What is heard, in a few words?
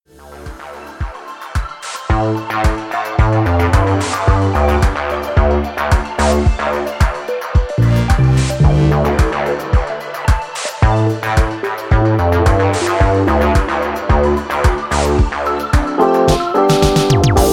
Instrumental